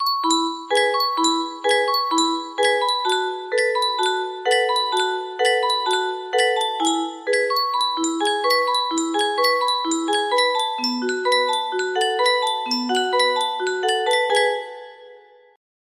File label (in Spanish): yunsheng caja de musica, music box melody